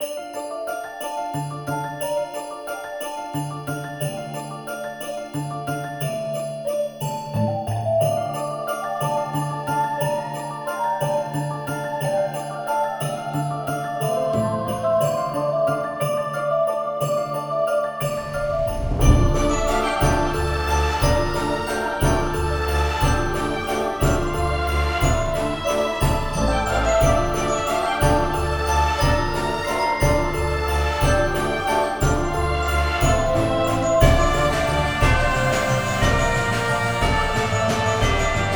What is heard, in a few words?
epic christmas; Jamie Coleman, Film Composer